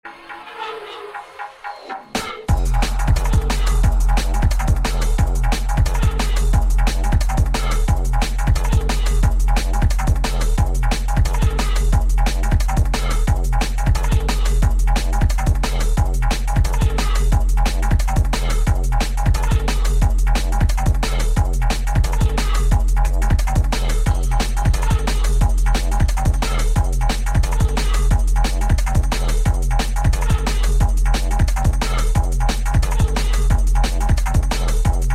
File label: dnb